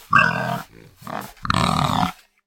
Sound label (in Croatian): prase; animals